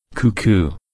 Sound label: cuckoo, wild birds